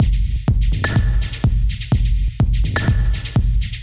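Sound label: berlin berghain techno dirty loops F minor 125; drum loops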